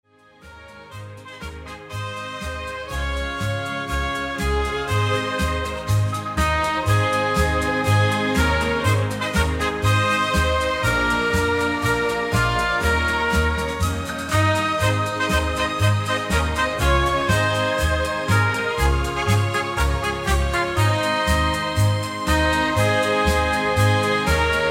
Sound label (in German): Sehr rhythmisch, Polka, Marsch oder Foxtrott, mit leichten Walzereinlagen.; Old Germany